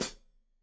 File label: Hat Foot, Hi-Hat, Cymbals Stereo